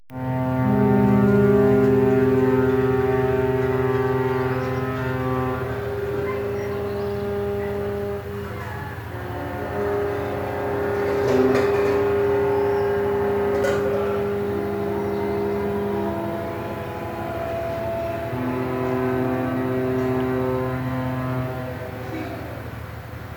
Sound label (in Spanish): puerto; francia; marsella